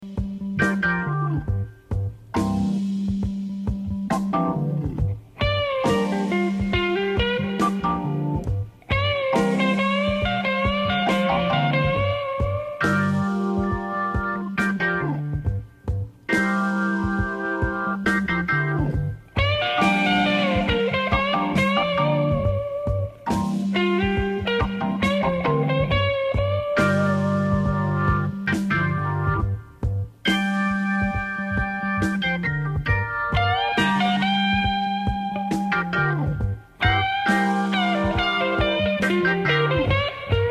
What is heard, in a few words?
Hammond